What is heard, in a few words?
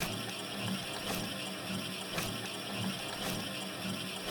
assembling machine; factorio; Factorio 0.14.21